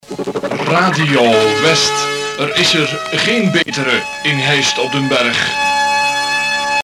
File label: jingle; West HODB